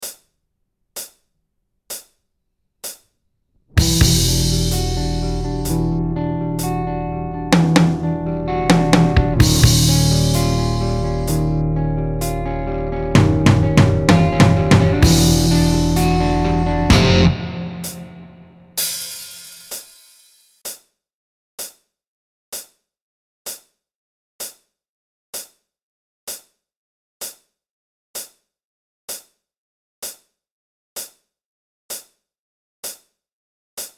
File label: jam no lead guitar